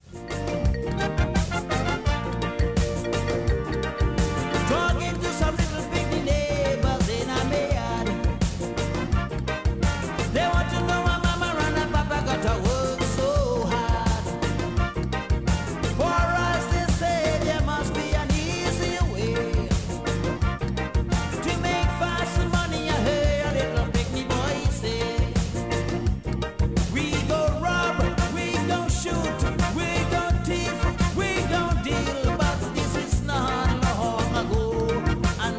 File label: Caribbean Band; Seattle